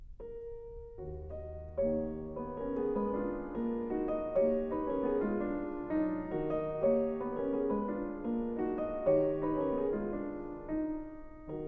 Mazurka in E flat minor, Piano

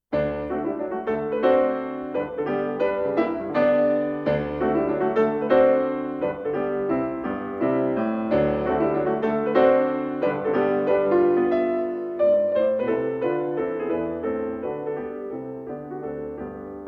Mazurka in G major, Piano

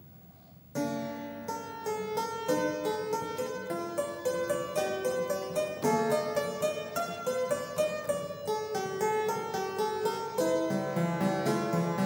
Prelude in B; Prelude 23; BWV 868; Bach - WTC - Tilney

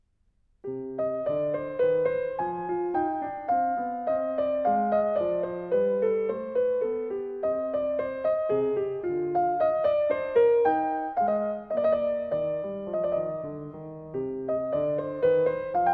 Menuet; Bach French Suites

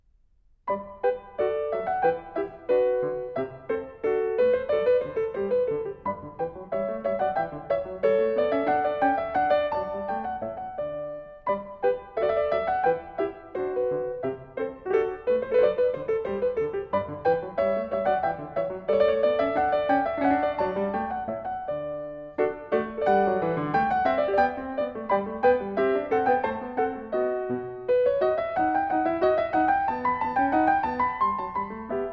Gavotte

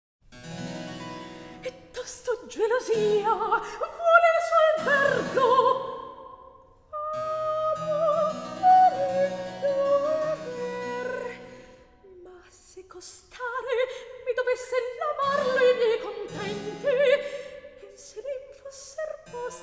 Recitativo; Atto I, Scena 12; Rosane

